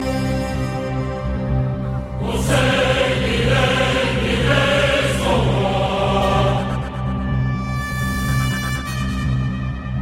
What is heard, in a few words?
Msg Tone; Raone